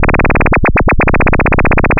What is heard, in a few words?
Noise, LFO & Noise